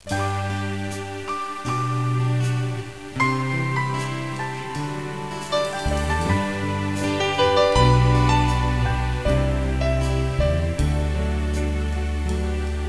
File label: singing in background; Rainwood